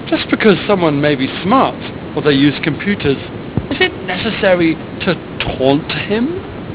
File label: Shot; Captain Correct